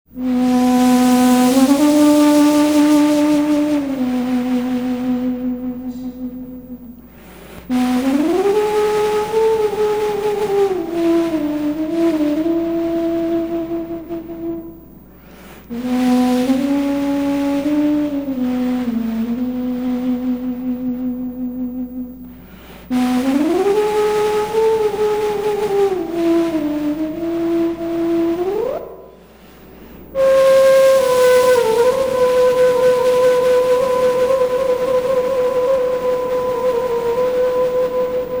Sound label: Siren